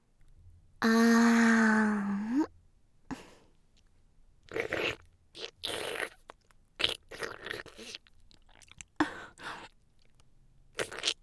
ちゅぱ音, ASMR; ＳＥなし

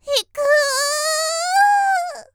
絶頂「いくーー！」; 綾波系; 少女

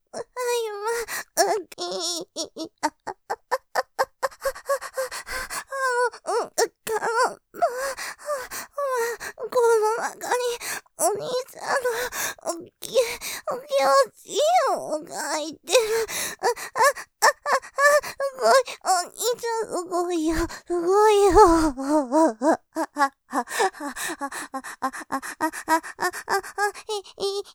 喘ぎ◆気持ちいい、おまんこの中におっきいおちんぽ入ってる, ASMR; モノラル; ループで楽しむ！シェフの気まぐれ抜き抜きコース

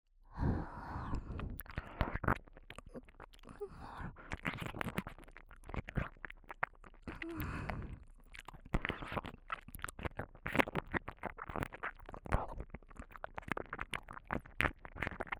本編使用耳舐め素材

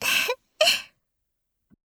ふふっ, ASMR

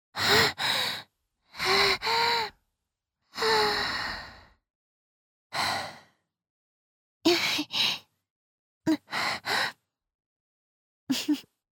絶頂後の息遣い; 実用ボイス抜粋集

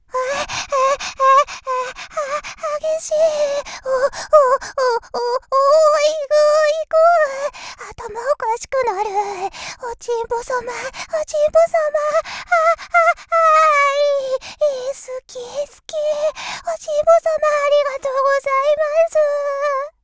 あぁん♥あっ♥あっ♥あっ♥

